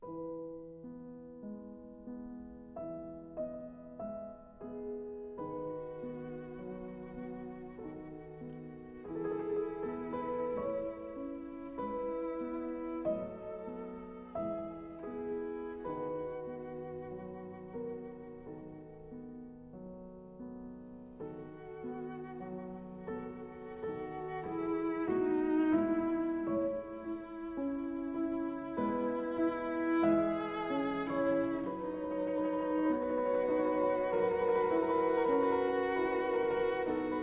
Sonata for Cello and Piano No.3 in A, Adagio cantabil